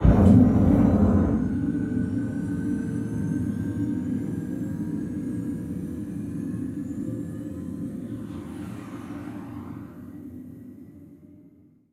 Engine Start Distance; Puddle; puddle plus ios gamerip 2018

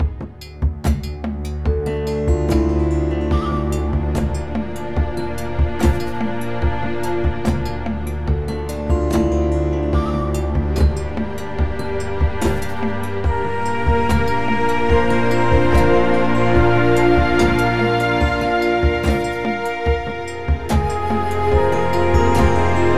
Medieval Theme Looped; World of Prandis; Android

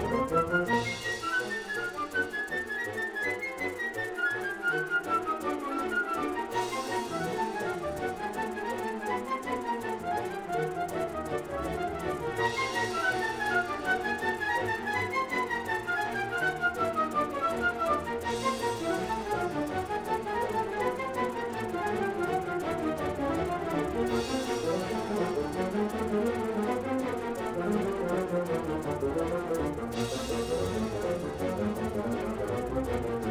Music Short Punctuations Mess 164bpm Loop; asterix obelix slap them all 2

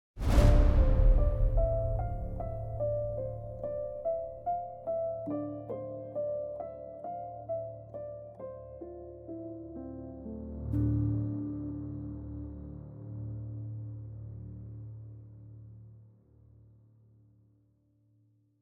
Home Sad Stop 50bpm; project zomboid; Everything Gone

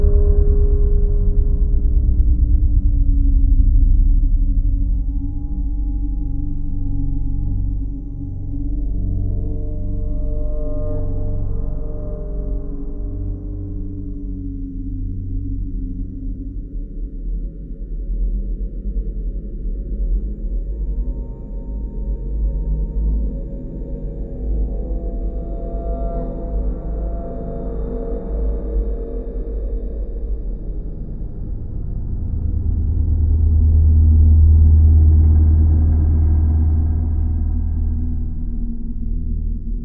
Cave & Dungeons Deep Dark Loop, RPG Fantasy Music; Sofiya and the Ancient Clan; PS4, PS5, Switch; RPG Fantasy Music Pack 02

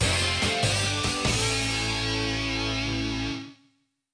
jingle battle win; Gintama no Sugoroku; PSP

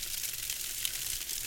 Medium Loop Fire

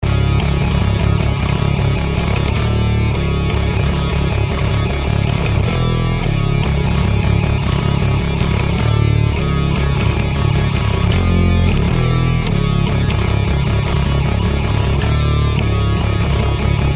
battle Bass

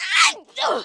Air Bail Female; Kelly Slater Pro Surfer

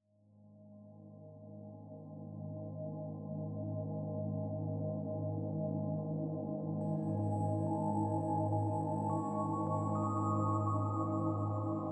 City - Normal; Hordal City; Dwerve

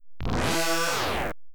Combo Fanfare; Getaway The High Speed II; Williams; pinball

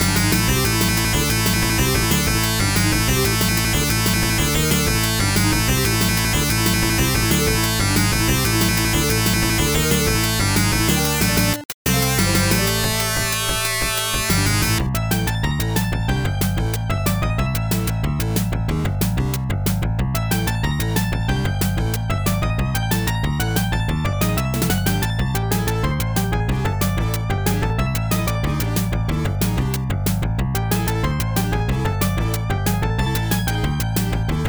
Eerie Village; Sorcerian; Genesis

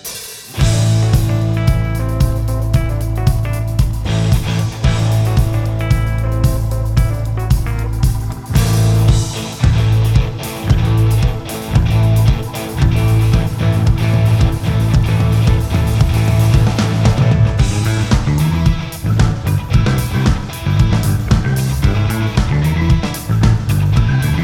victory; persona super live p-sound wish 2022

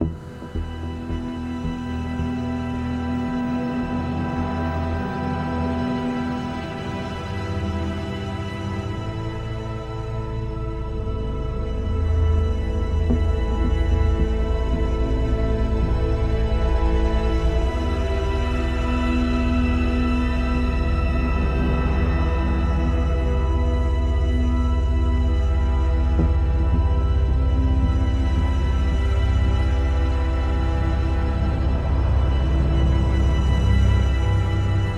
loop Suspense

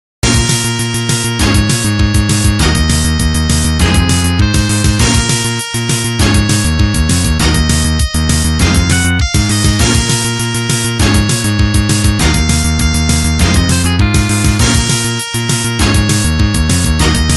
End Level; Dragon Ninja Saga

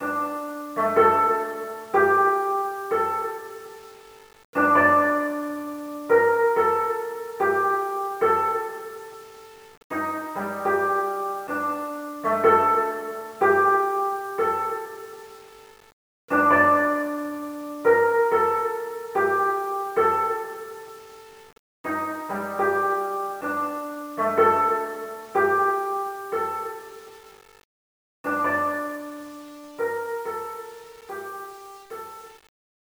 bgm koto; Yakuza Ryu ga Gotoku Kiwami 2

